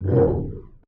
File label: Whoosh Woow